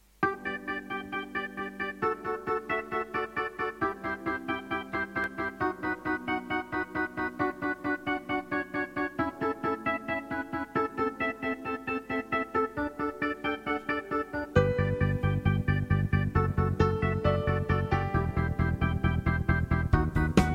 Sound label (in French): Alto Oiseau